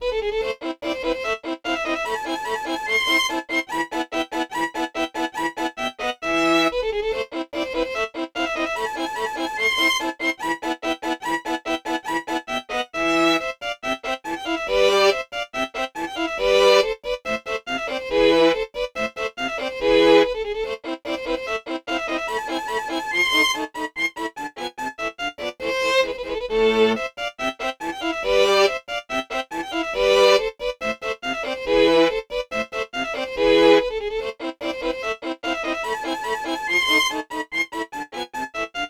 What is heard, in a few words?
fiddle